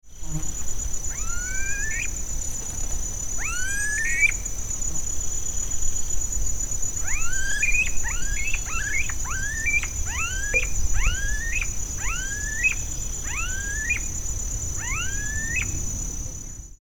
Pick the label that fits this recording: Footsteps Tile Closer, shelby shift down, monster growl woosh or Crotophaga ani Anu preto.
Crotophaga ani Anu preto